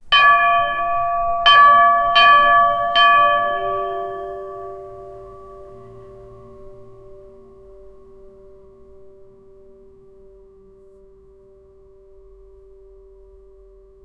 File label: church bell playing in a, bassy